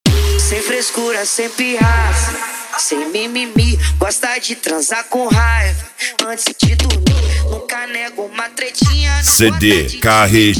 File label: mega bass